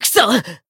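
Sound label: damaged, Japanese; curio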